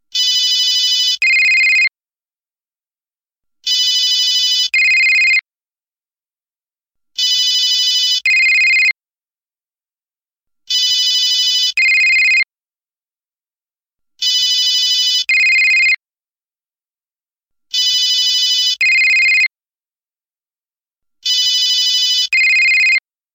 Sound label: Classic Cell Phone